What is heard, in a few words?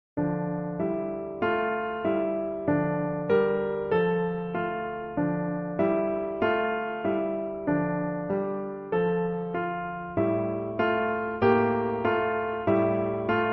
Accompaniment Track